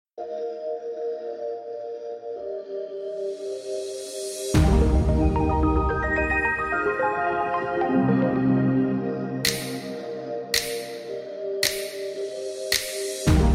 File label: Karaoke Version